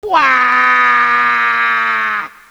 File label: wahhhh